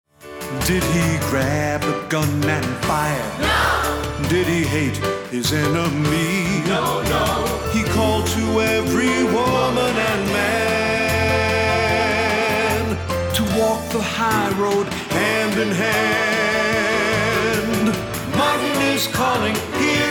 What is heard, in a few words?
calling